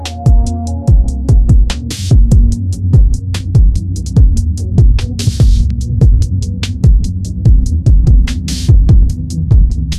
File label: chill trap, musicgen